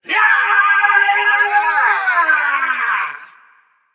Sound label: battlecry; smite; xbalanque